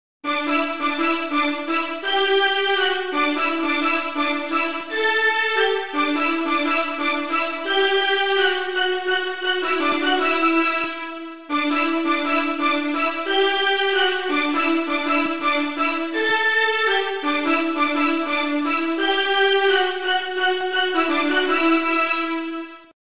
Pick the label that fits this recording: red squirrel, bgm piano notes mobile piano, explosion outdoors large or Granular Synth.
bgm piano notes mobile piano